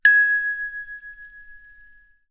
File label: chimes single note